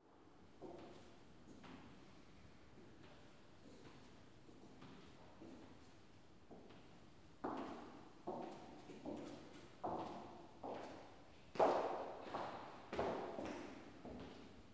Woman S Steps At An Exhibition Kunsthalle Emden

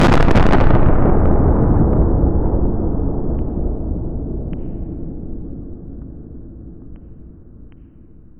Jump scare Audio

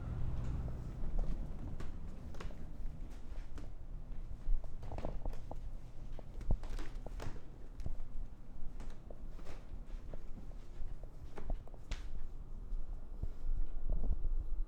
Footsteps On Wood Floor

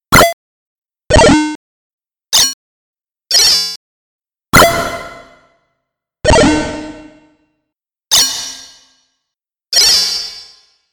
Harmonic Bleep Reverbed

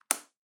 Power Cord Unplug

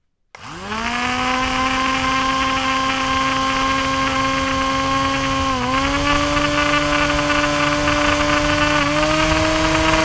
Mixer

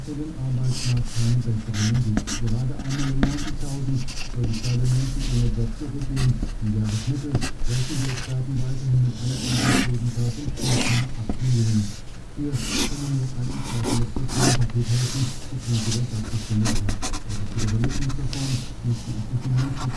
Drawing; Punkt Punkt Komma Strich

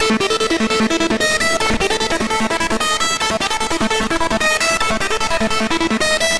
Pad

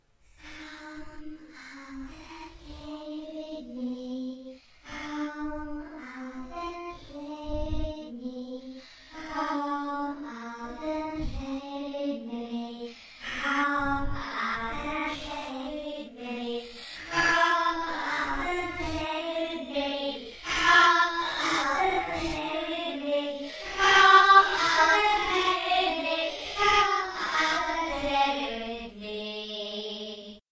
Come Out And Play With Me Song

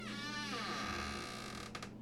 Creak

